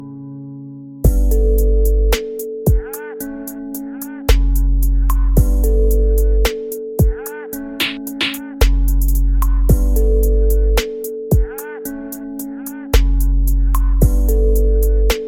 Instrumental

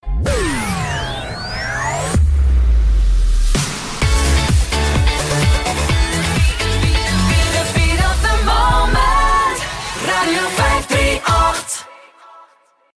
Radio tune

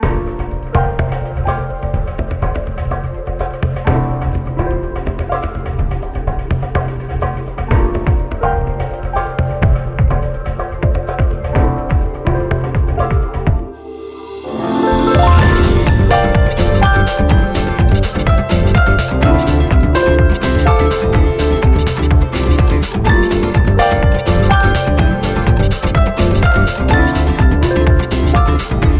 Mystical Forest

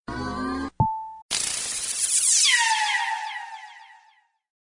notification

